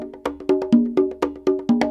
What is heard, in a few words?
PERC LOOP ETHNO 125